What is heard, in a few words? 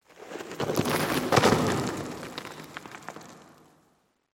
cavern excavation rockslide; Half Life Alyx